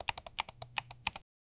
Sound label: crisp medium pitch mechan, computer